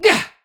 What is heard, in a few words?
Attack Effort; Warframe; Tau; Dax; Ninja